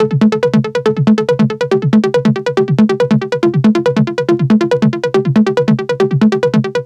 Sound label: Progressive Synth, Trance